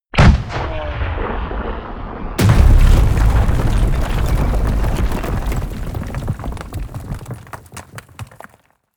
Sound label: air strike